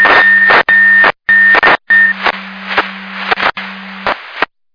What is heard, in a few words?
Siren; Hamburg FD